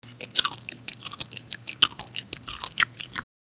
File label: eating sound effects